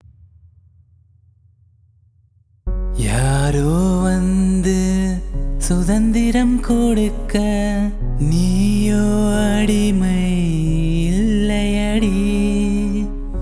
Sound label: Unplugged Cover